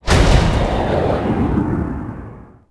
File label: Jet Hurt; Civ4 beyond sword